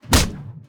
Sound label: blacksmith strike